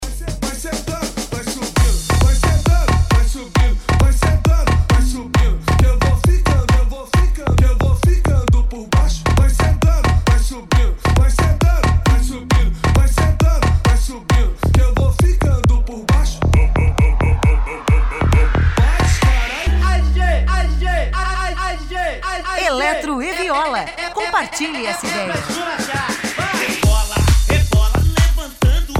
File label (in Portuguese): eletro e viola especial de eletro funk